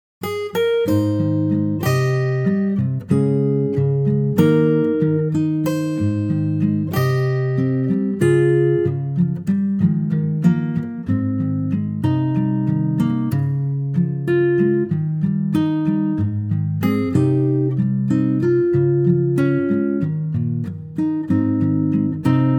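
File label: Ab to Bb Acoustic Guitar; key - Ab to Bb - vocal range - Eb to C (optional F falsetto after the bridge)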